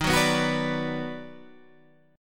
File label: D sharp Minor Major 7th Sharp 5th